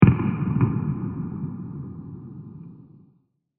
Explosion With Minor Distortion; Explosions; Crafted Signals

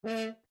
Horn A4 Staccato Stopped, French Horn in F; Alexander French Horn in F; Musical; Horns

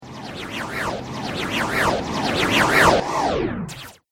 Magic Elemental Spell; Magic Rpg

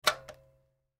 Monitor Turn Off Switch Power Crt Screen; Pc; Computer; Computers; Technology; Blastwave

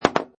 Pinball Sound Ball Drops; Video Games

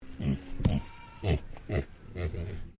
Animal Pig Landrace Grunt Sequence; Pigs; Peaches; Animals from Edgar's Mission Farm Victoria

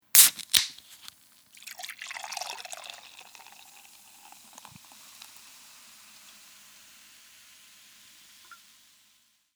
Opening a can of beer and pouring into a glass.; Objects

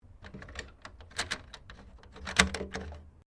KEY LOCK SECURE; Objects

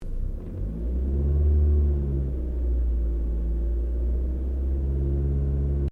CAR FORD FOCUS 2009 REV INTERIOR; Ford Focus hire car; Vehicles

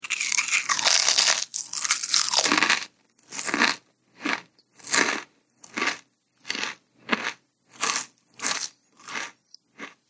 Noisily Eating Potato Chips

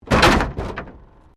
Wreck Door Panel Clunk Metal

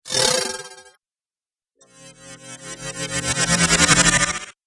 Whoosh Duo Pack; Whoosh Sound Effects; Andrew Fleming

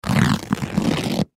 Upholstery Rip, Upholstery Rip Tear Leather; Ripping; Foley; Blastwave

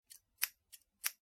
office scissors cut air slow x2; Scissors Cutting Air ( 2x Snips ); McKinney Sound

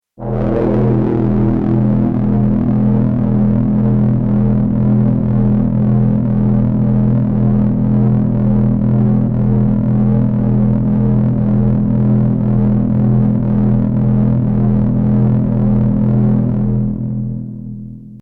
Drone: Eerie, Menacing, And Atmospheric Monotone; Drones; Sound Design; Stuart Duffield

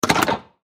Button Multimedia; Web Interactive; Buttons; Technology; Blastwave

